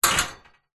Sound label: metal frame short sharp movement rattle